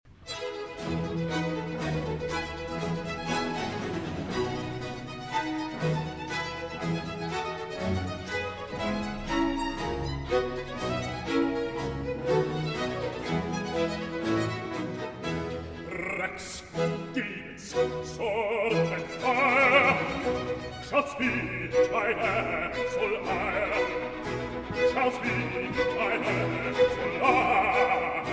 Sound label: Air; Racks gibbets sword and fire